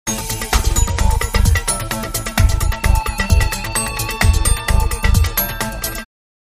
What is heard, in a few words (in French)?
Crates Bois